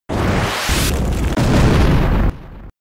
Fire Blast; Pokemon; Gen 5; Blk Wht Blk2 Wht2

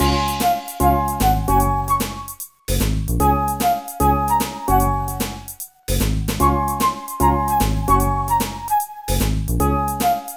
Music Mini Game bonus

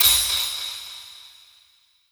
speech promise

